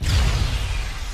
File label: Bomb